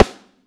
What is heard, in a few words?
Snare, Drum & Bass; 90 s Sample CDs; Total Drum&Bass